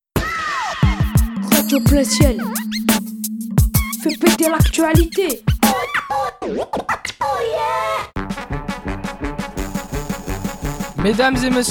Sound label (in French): jingles